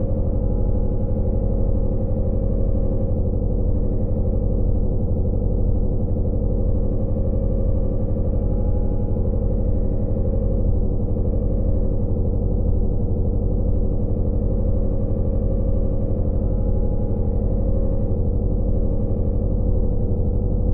decontamination, ambiances

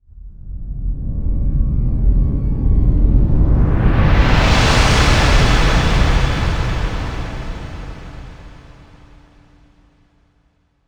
debris hum; CosmicRage; ships salvaging

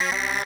ooc denied; comms; CosmicRage; CosmicRageSounds

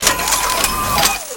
aim, combat; airpod

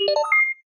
report; dark matter mining

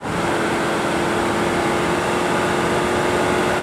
start, highway

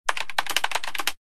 keyboard, ships computer

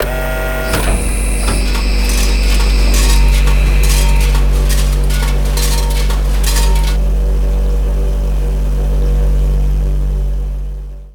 sort, mechanical mining

